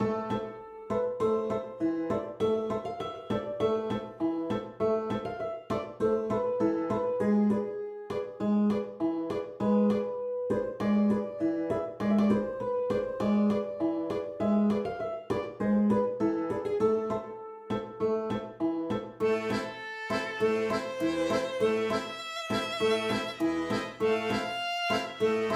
merchant loop; Steamworld Quest Hand of Gilgamech; nintendo switch

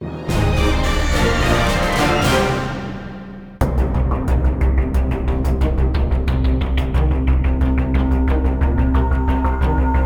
Win Loading; modern combat domination; ps3

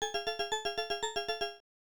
Monster Spurt Warning; Namco Classic Collection Vol. 2; arcade; Namco ND-1

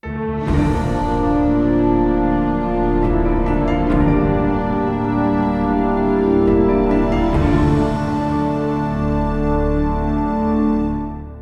Heroic; Mass Effect

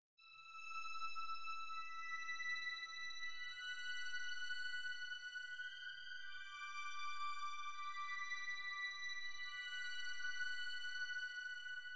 Police

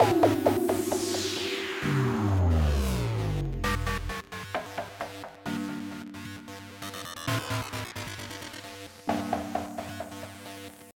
BGM Scene Result page lose; Turbine Fighter; Animoca Brands; Android

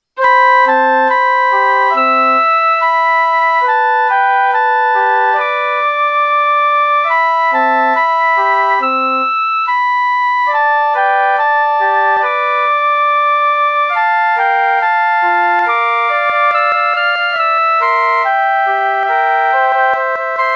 bgm good

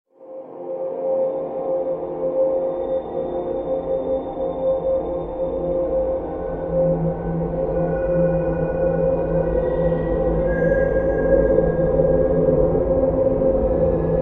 music Travel

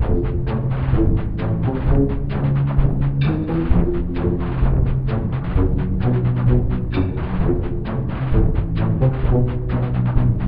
marching uncanny loop; spaceship

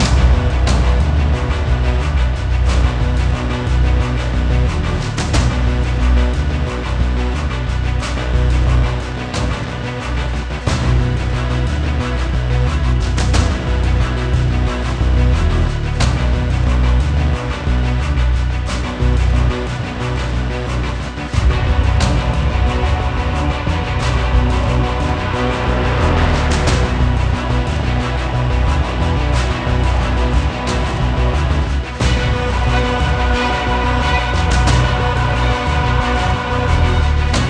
Industrial Combat; LAYER 5

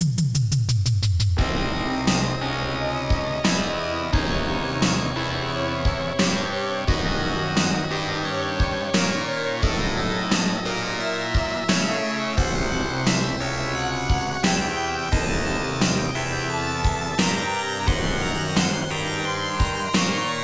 Limited Time (Continue); Vendetta; arcade

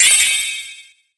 Ice; Etrian Odyssey III HD

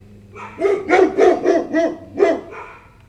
Dog Bark Close; Counter-Strike: Global Offensive

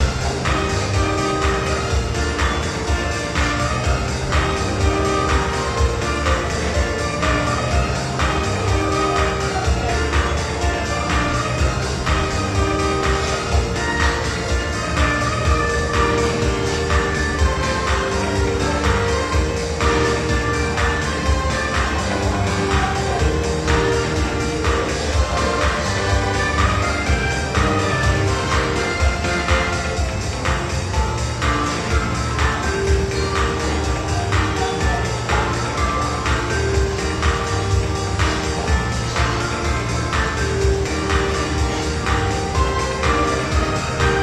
Host Club Ambient BGM; Stardust

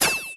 SFX - UI Connected; Mario Kart 8

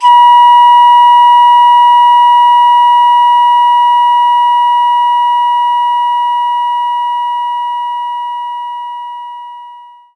Ocarina B5; knights try

